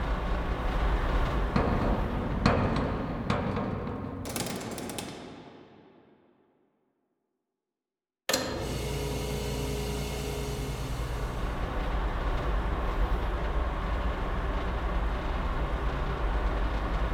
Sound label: Basement; Toy Odyssey The Lost and Found